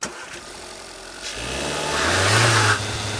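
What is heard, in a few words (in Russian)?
равномерный четкий звук работающего двигателя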